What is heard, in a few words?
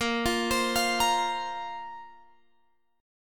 Bb Suspended 2nd Bb down Guitar Open F; Bbsus2 arpeggiated